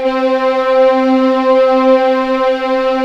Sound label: C4 EXP MF, VIOLINS; East West; The Ultimate String Collection